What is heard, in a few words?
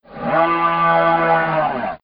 Spaceox; tempest 4000